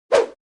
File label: change slide sfx, transition